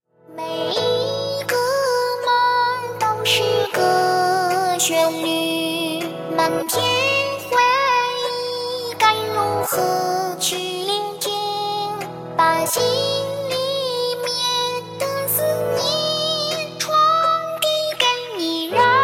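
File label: background music crossfade; Invaxion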